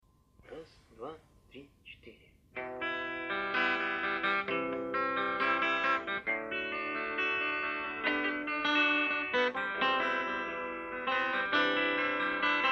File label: unplugged